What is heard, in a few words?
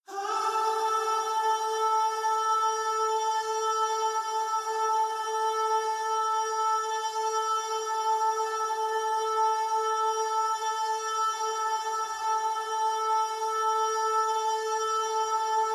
pop vocal no scoop A